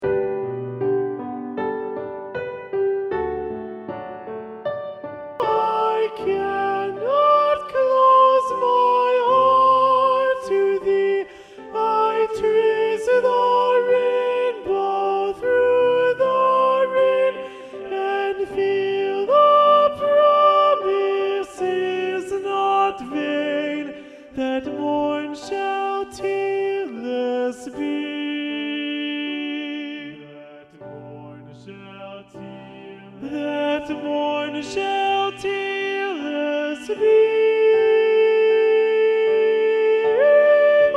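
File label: Soprano 1 Predominant, choir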